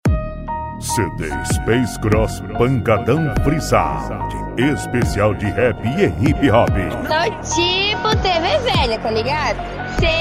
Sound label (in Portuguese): pancadao rap e hip hop